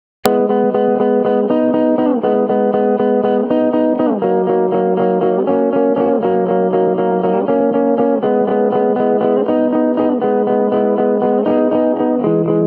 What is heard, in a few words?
electric guitar, shifting